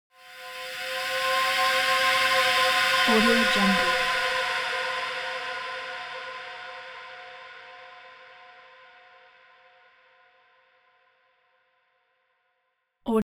cinematic swell erratic cry high

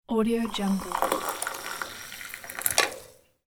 pouring cofee

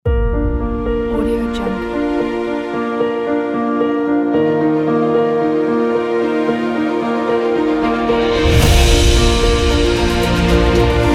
cinematic inspirational orchestra ident

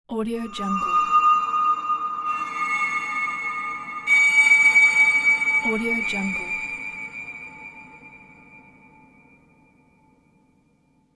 space whistle

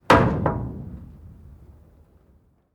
metal hatch

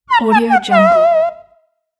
cartoon comedy noise

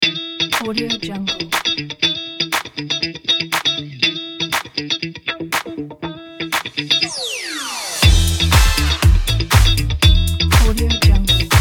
funky beat

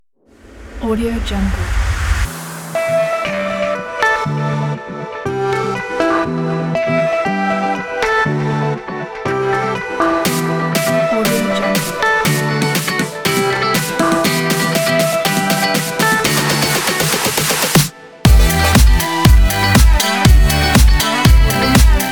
summer sport fashion dance

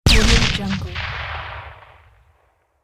explosive ricochet blast

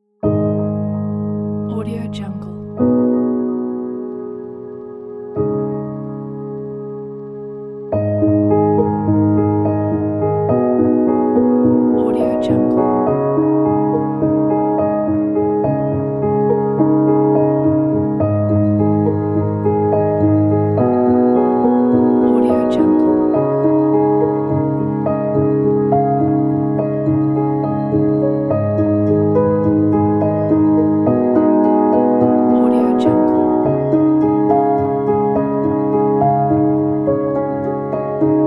documentary soft ambient piano

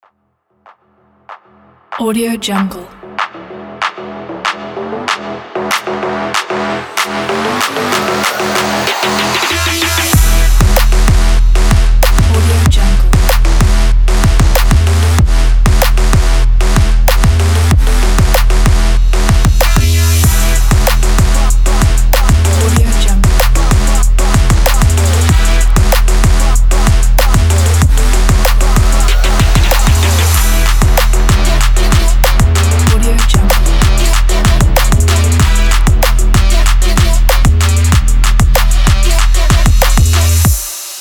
trap

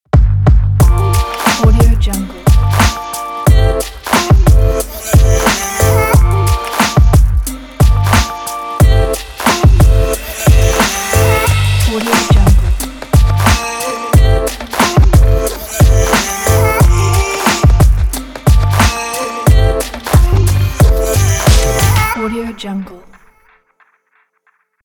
modern hip hop ident

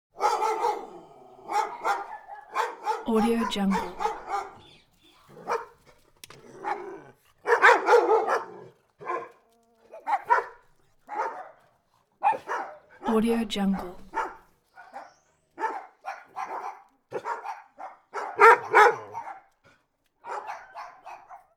animal dog dogs neighborhood bark; smartsoundfx

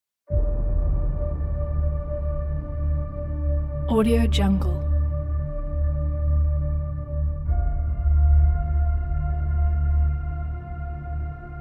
epic progressive cinematic trailer

